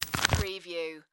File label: Police Badge Open